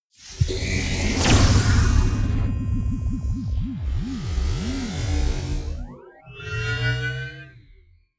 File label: magic warp personal jump depart; swooshes-swishes